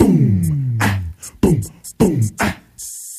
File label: whatchagonnadew, Beatbox; Drum loops